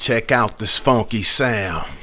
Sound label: Rap Vox